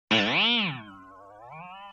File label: Boing Box; Vintage Cartoon; Hits & Tones